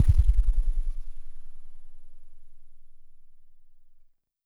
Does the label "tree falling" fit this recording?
No